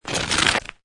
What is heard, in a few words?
Snow And Ice Hard Snow Or Ice Grate; Weather; Lynne Music